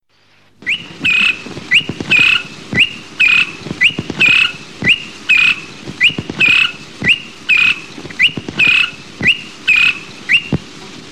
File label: Not Guiding call, honeyguiding